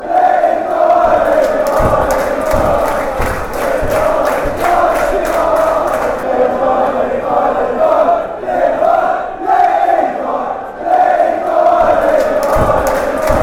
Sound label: charlton chant